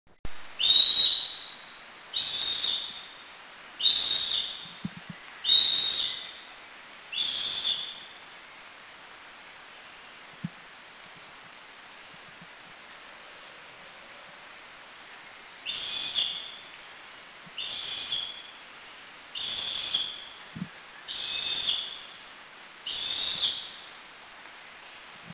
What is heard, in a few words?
Wallaces Hawk Eagle; Thailand; Krung Ching